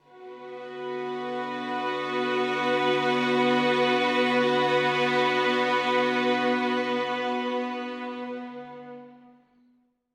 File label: Loop String Swells Bm 1st Inv